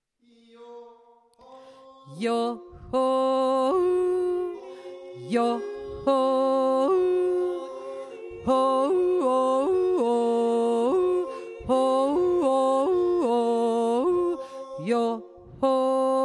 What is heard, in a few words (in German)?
3 stimme, jodler